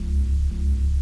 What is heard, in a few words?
summon knife charging loop, weapons